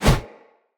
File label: Husk Whoosh, Unarmed; Weapons; Enemy; Mockery Husk